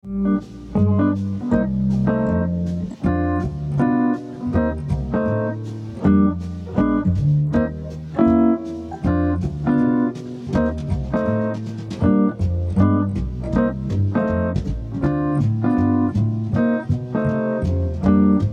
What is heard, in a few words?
Jazz Chords